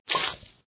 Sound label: Minigame Hot shot Hit; papas cupcakeria online windows gamerip 2013